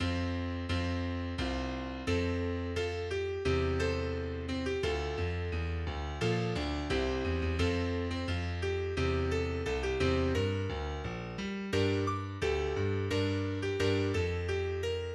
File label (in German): Klavier